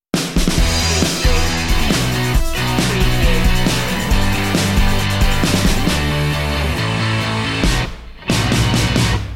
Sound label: stinger